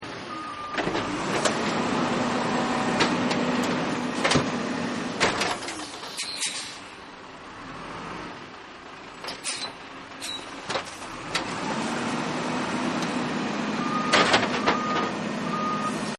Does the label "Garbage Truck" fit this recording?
Yes